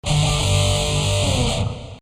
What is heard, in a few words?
Power Chord